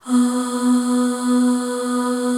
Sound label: B2 FEM AHS